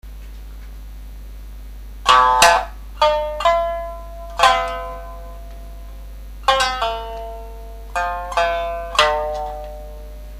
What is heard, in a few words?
yokobue; yokobue gensoh